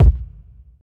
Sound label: big room bass drum sound A key, bass drum sample tuned to the A note; kick drum samples